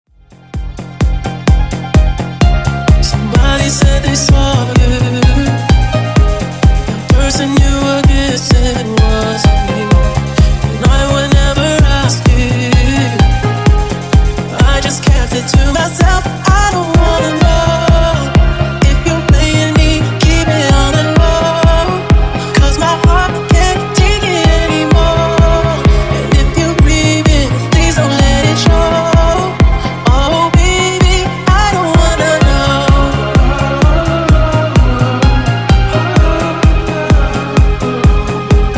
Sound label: Funk MashUp 128 Bpm